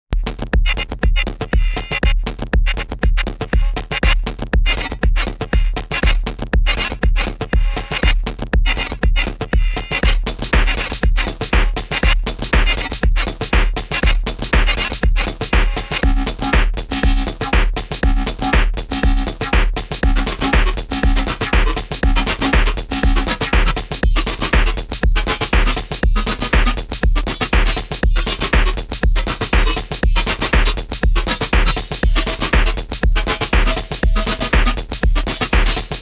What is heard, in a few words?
dirty electronics